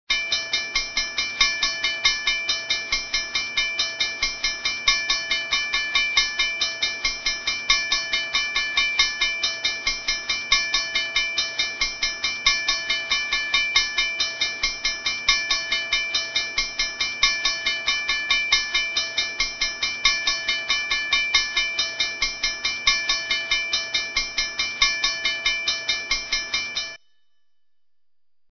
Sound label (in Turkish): tren sesleri uyari sesi; tren duduk sesleri